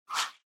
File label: Motion Swish Mid Single; モーション スイング09-04(中 単音)